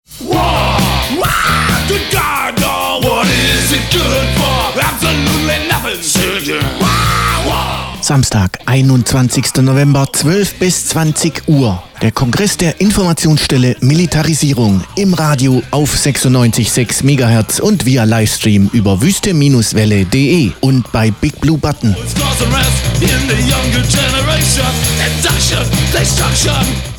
Kongress Jingle; IMI; Politik der Katastrophe

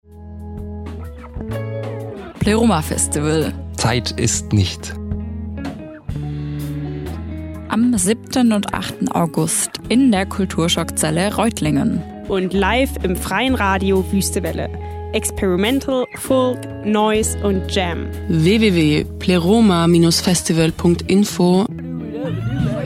Jingle lang; Pleroma Festival

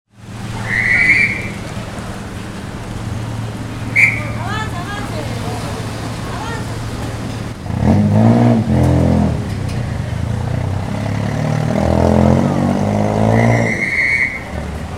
Trillerpfeifen der Verkehrspolizei cusco, auto

